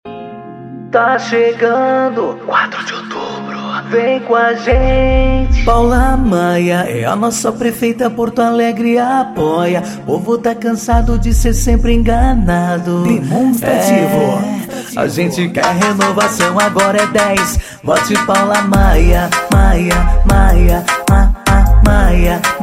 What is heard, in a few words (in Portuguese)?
jingles politicos, Brega Funk